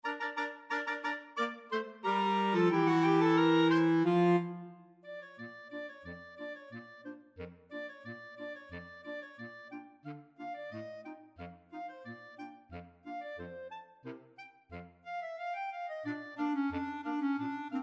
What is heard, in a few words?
clarinet choir